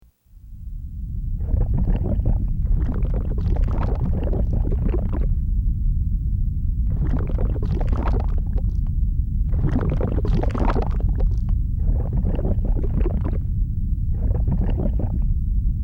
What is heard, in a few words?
Bubbling Ambience; starfox